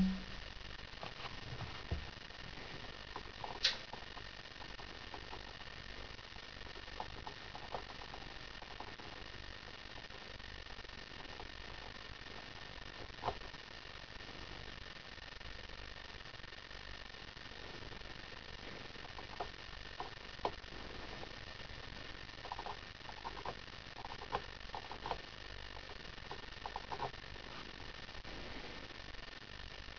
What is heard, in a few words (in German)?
Kugelschreiber Kugel